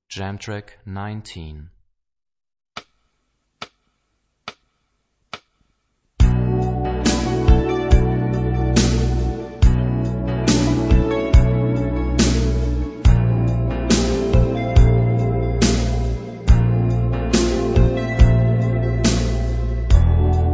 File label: Rock guitar; Rock gt Secrets